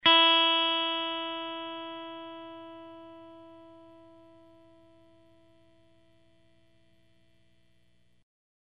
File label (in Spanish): guitarra afinar cuerda uno